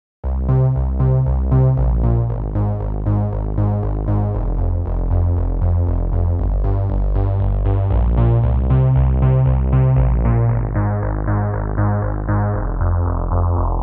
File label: Modular Bass; VIVO S10